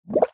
cartoon bubble; zapsplat

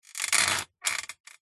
metal frame movement creak; foley

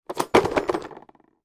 toy glockenspiel drop on floor

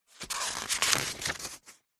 household book diary page turn

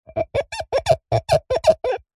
glass rub squeak finger

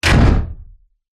human body slam against metal surface

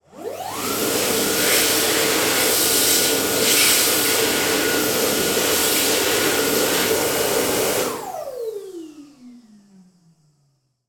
public places toilet hand dryer airblade; dyson

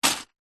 toy lego impact many pieces in box

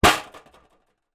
foley small metal case tin lid open drop down; zapsplat

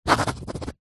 office pen rollerball sign siganture on card

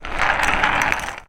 household flashlight metal roll ground; zapsplat

